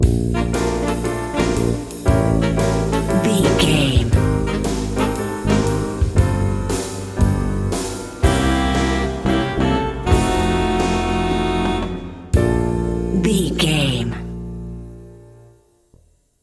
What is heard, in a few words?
thinking things over bumper